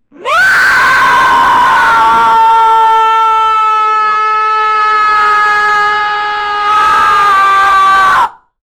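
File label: gigantopithecus scream, ape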